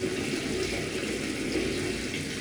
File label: water pipes; Soldier Of Fortune II Double Helix; XBOX; ambience